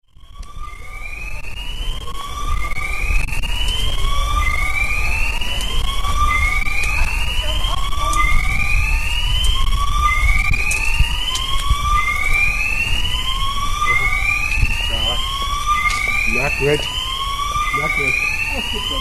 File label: alarm; hilke